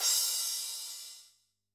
Crash, drum

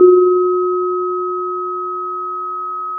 pop, Sound of a church bell

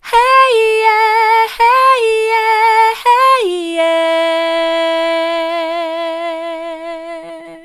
hey mid, vocal

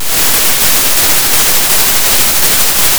Sound of a thunderstorm

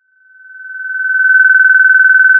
Sound of a phone ringing

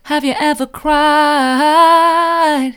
have you ever cried mid, vocal

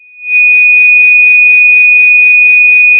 Sound of a tea kettle whistling

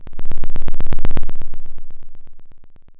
Sound of a lion’s roar

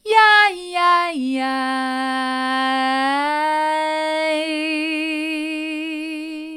yi yi yi hard, vocal

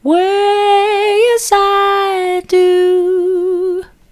way as i do soft, vocal

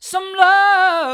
hard, vocal

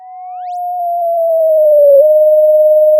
Sound of a police car siren

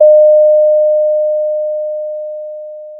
pop, Sound of a doorbell

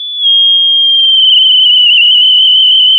pop, sound of a tea kettle whistling

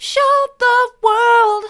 mid, vocal; show the world

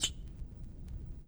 Vinyl Perc

